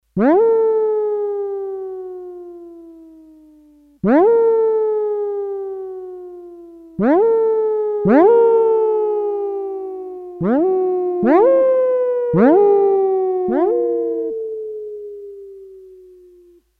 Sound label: Lonely Wolf, Roland D-10; Factory Patches